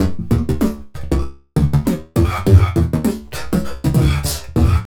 Beat Box, Hip-Hop and RnB Vocals

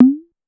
Tom 808 Up, Pitch Env Various, Clavia DDrum; Clavia DDrum-AT

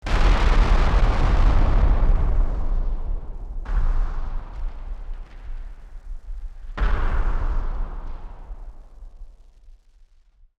Party Popper FX

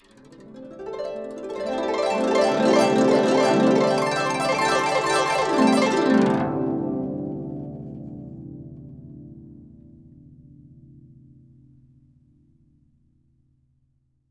GUCH GLS, GUCHIN; INIS - Opium; GUCHIN-GLS

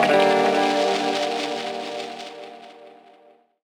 RAW DIRT; Rawcutz; Nu Forms

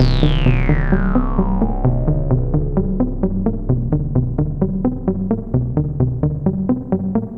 130 MODU Cm, Modular Synth Loops; Polyend; Simulacrum Sounds

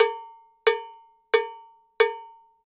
Cow Bell, Latin Percussion

